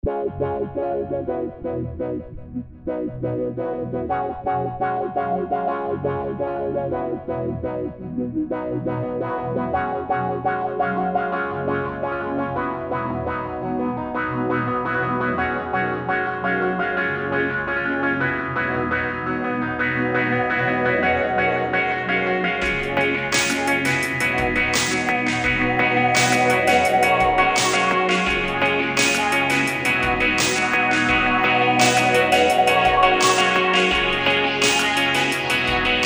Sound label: Pad and piano